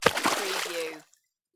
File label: Rock splash